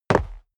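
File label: step dress boots concrete jump, footsteps; schritte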